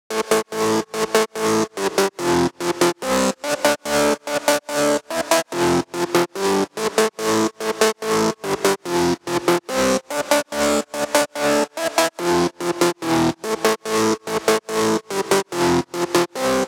144 BPM Chorus - Acid WET; Vengeance - Essential Clubsounds Vol.5; Flight Kit Root Amin; Melody & Synth

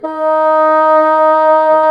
BASSOO, Bassoon; Sound & Vision - Gigapack I CD 2 (Roland); Brass&Woods1

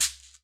SHEKERE, Latin Hand Perc; E-MU Producer Series Vol. 2; More Studio Essentials; Composer

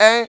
FEM PERC, Vocal Jazz FX

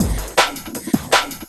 Loop, Drum & Bass, 160 BPM; Ueberschall; Drum & Bass Flash Trackz

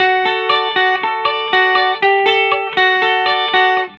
BYRDY RIF 1 D, Vintage Blues Guitar, 120; USB Soundscan vol.22; AKAI

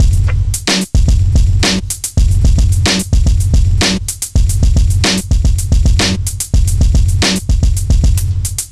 beat boy 110bpm

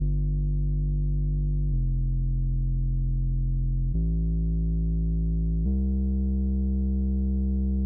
Cmaj 122BPM Bass; 99Sounds; Monosounds; Music Loops; PANDORA BOX!; Palm Vibes

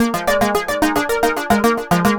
Lead

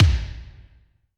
Kik Big O, Drum Machines Alesis D4

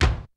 kicks snare, kid; Kid Nepro; Elite; KICKS SNARES

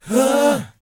BOYZ B, Vocal Planet R&B & Dance; ILIO

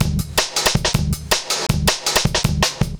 Dizzy Loop, Drum Loops Compressed; Zero-G Smoke

